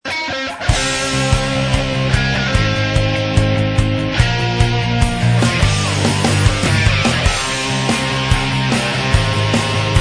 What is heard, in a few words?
Solo